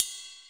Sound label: Ride; Equipped Music; Loungin House